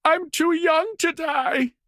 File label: town human far; Blind Drive